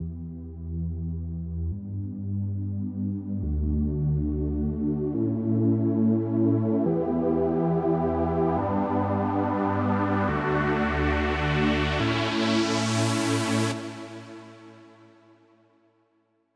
140 BPM Intro Break Pad; Vengeance - Essential Clubsounds Vol.5; Vengeance Intro Kit; Root E; Synth

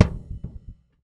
Extra Rumbles, Kicks; Airwindows

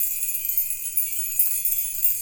Fast Key Loop, ambient twinkles

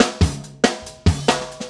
LOOP SD, Drum & Bass, 140 BPM

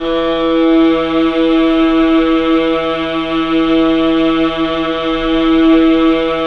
viola, 3D Viola Xtreem; E-MU Producer Series Vol. 5 – 3-D Audio Collection; 3DSynths

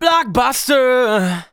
vocals sung F#; Techno Trance Essentials

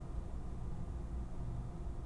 BLOWER, 3D Organs; E-MU Producer Series Vol. 5 – 3-D Audio Collection; 3D Pipe Mix Celeste

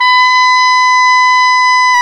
OBOE, Orchestral Winds, Oboe mf f; Roland LCDP04 Orchestral Winds; Oboe 2&3

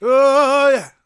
gospel male, vocal; ILIO; Vocal Planet

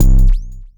Synth Kick

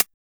909 TEK CH; Drums In Your FACE!; BONUS HATS

